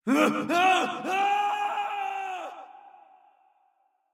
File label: Prolonged screaming; McCree; overwatch